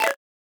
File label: graphics digital click